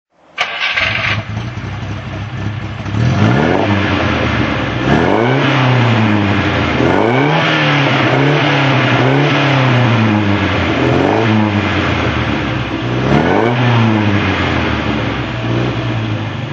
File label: facel vega facellia f2 throttle